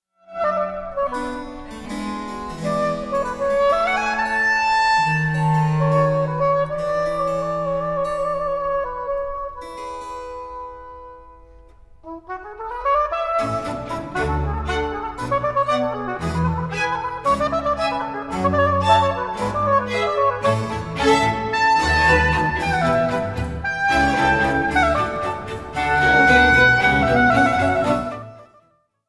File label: concerto in g minor for oboe strings and basso continuo; Giuseppe Sammartini; op 8 no 5